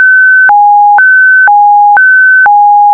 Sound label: ambulance